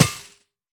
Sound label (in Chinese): 刷怪笼破坏声